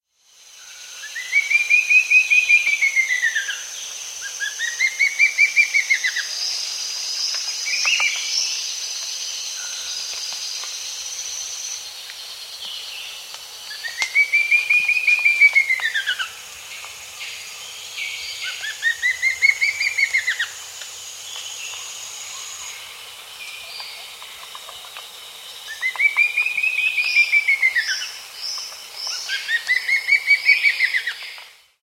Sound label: Spot-Breasted Antvireo; S. America